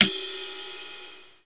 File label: normal hit whistle